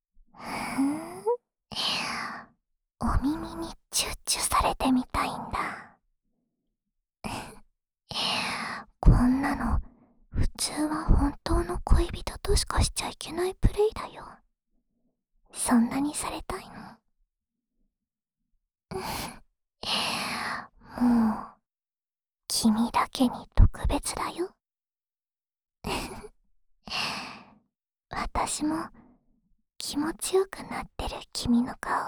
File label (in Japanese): オナサポ用耳舐めループ（右耳）; 特典 青春青春べろちゅー委員会♪～唾液混ぜまくりのディープキス&超絶テクニックで、連続射精の口内恋愛!